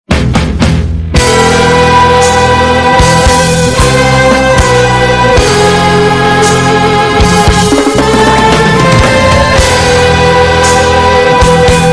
music core exposed lp; Risk of Rain music pack; in-game music

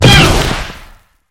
wall jump; wall jump trick

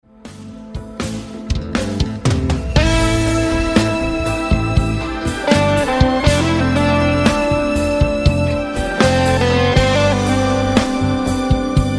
music brawl round start 95bpm; Risk of Rain music pack; in-game music

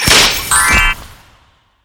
animal curse aoe start; kitty0706 sinclair sounds; magician

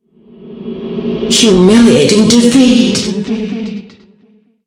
music stinger game over lose; killstreak music

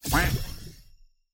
magician copy ult deselect; kitty0706 sinclair sounds

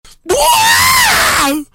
pain death; billy ghetto smosh

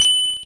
dagger hit; shiv; deltarune flowery over shiv abilities

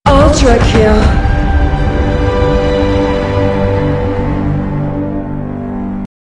music stinger; killstreak music; UT2K4

